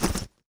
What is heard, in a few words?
Backpack